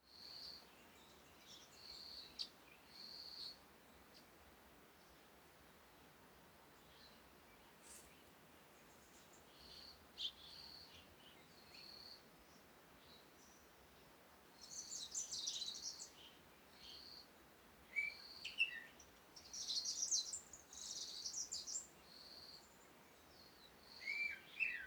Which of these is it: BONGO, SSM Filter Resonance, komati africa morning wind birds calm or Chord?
komati africa morning wind birds calm